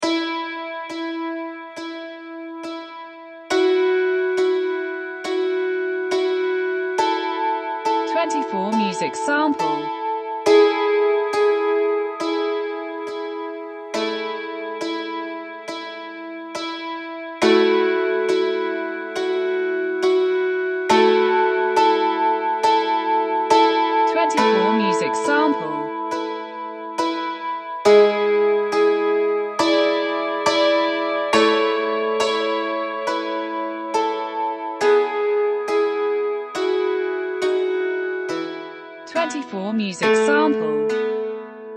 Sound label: dulcimer